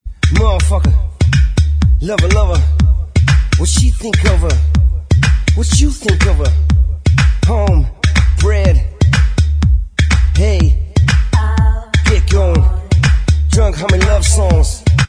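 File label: remix backing vocals track, backing tracks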